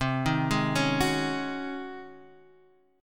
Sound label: Db Major 7th Suspended 2nd Suspended 4th, C, guitar